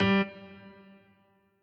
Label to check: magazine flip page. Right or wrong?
Wrong